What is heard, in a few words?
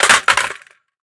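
rifle drop concrete; STALKER; IWP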